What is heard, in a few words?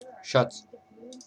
Shots